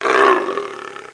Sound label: GROWL; Total Chaos Nukem 3d Pack; duke